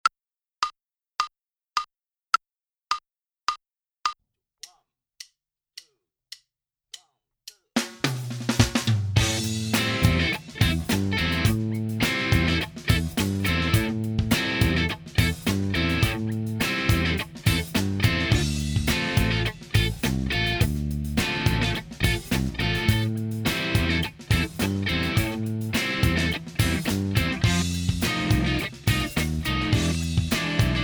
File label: Minus Keyboard; Inst Ensemble